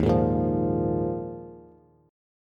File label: Eb Suspended 2nd Suspended 4th, Bass; Ebsus2sus4 strummed; JGuitar